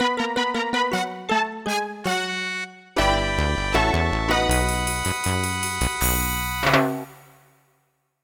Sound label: Win